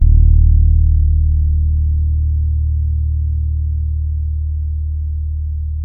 SAMBA, Bass

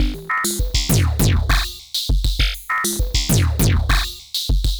Ratchet Beat; Sonic Foundry (Sony Creative Software); Ma Ja Le Ethereal Textures; Beats

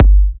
G, kicks; markus hakala kicks

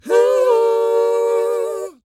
WHOA, R&B & Dance vocal; ILIO; Vocal Planet

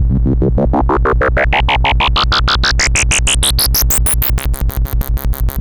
MOOG C3, Synthesizer; Hollywood Edge; Giorgio Moroder Rare Synthesizer Collection; MLTI.MOOG

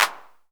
909 CLAP; AKAI S6000 CD-ROM - Volume 4; Snare 2

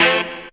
tuners

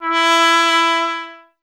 TP E; Miroslav Vitous - Solo Instruments VOL-1; Trumpet

